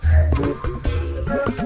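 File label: Music Dpad 145 Maxisphere; From Dust; PS3; Xbox 360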